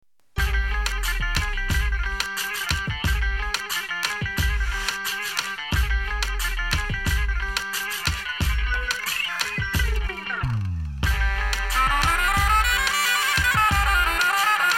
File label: Valle Dasmash Turbo; Valle Shqip